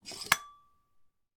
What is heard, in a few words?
household cafetiere lid off; sound effects one